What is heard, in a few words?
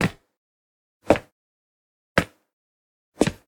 Footsteps; Rei Yumesaki